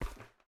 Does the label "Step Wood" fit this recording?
Yes